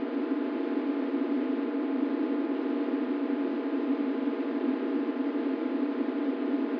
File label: roomtone, ambient tones